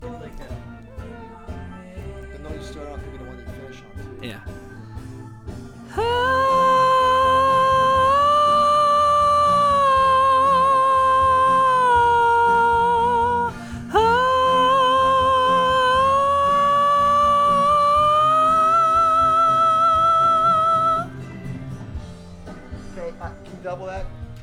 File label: VOX Double